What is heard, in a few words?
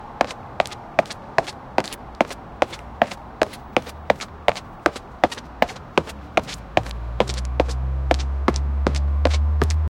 outdoor asphalt footsteps, shoes